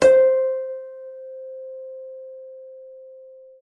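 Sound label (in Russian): арфа нота C6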